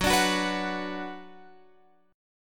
Gb Suspended 4th Sharp 5th Gb, down, Guitar, Open F; strummed